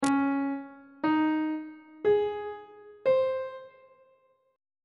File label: d flat major 7th suspended 2nd chord intervals on treble clef